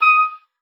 OBOE2, D#6; Xsample VOL-6

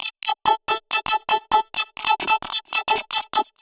132bpm Lines n Loops Synths, uk garage samples

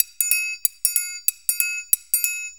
Shuffle Perc, Perc Loops; Sonic Foundry; Sony Creative Software; Loops & Samples Drum Tools; Shuffles

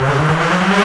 noise 2, sound noise; NOISE+ALARM

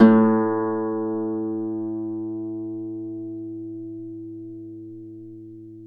Celtic Harp, Plucked Strings

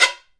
Guiro Chif; Digital Sound Factory

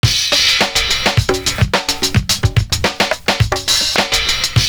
CONGA LIGHT, loop; Univers Sons - Kitch 70s

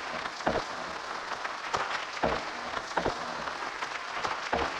Cracklature, sparse soundscape

